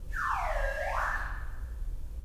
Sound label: slide whistle